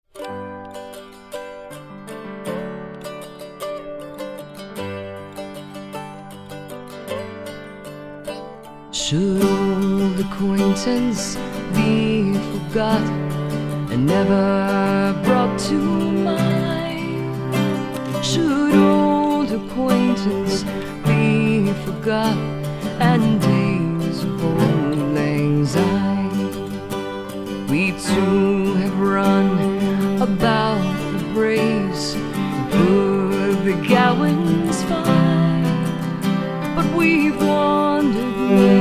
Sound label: Auld Lang Syne